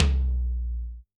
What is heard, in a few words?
Tom, drum